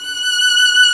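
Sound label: violin F#5, legato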